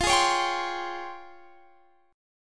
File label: Db Major Sharp 11th F